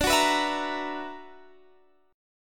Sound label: B-Minor Major 11th